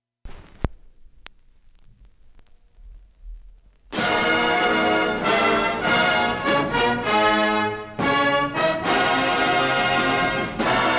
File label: Antiphonal Fanfare; OSU